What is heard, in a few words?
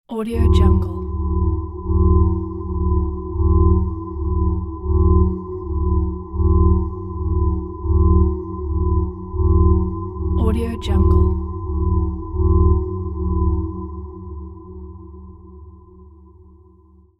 distant ominous siren